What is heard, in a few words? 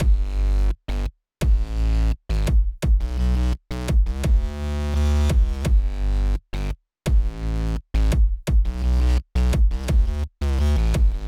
Bass; NONeutron